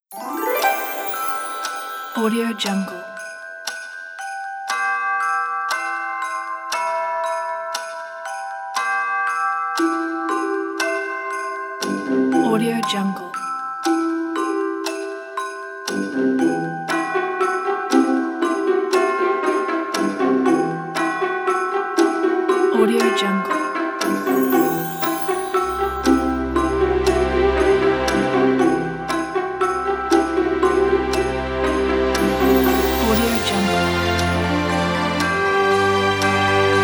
christmas time; coffee-panda

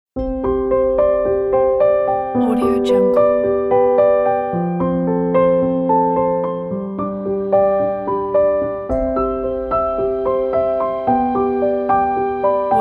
wedding piano and cello romantic emotional

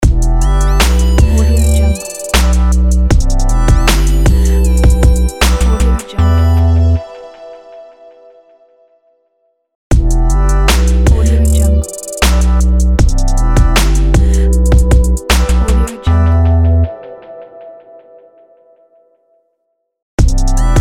happy trap logo

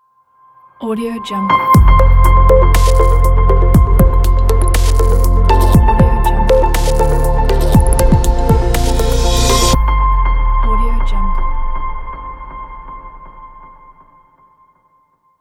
ambient technology logo

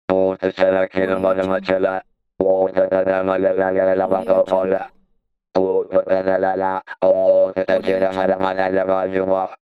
alien monster voice

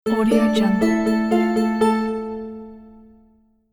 fantasy media tally; sonic-boom

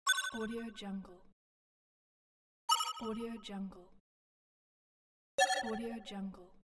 click interface

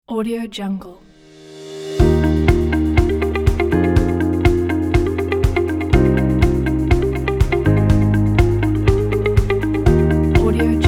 inspiring corporate motivational background; dailywaves

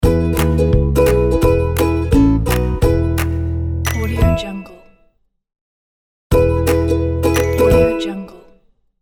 upbeat happy ukulele logo

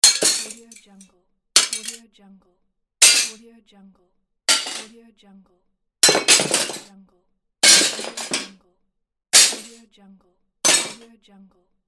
glass break

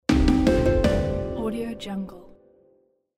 ancient rome win; tibasound

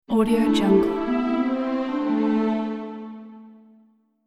heroes demise

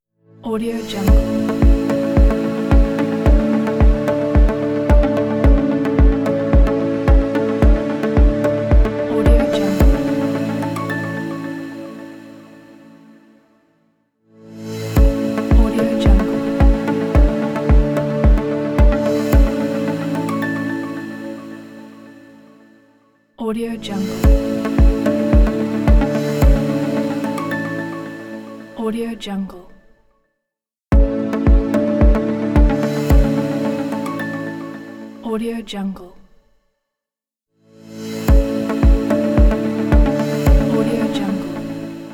intro logo corporate podcast; crimsontreestudios

